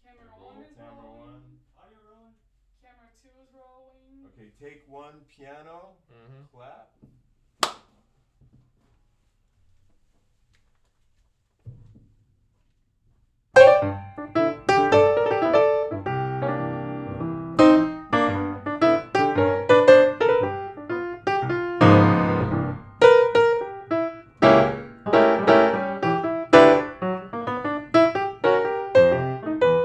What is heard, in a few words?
piano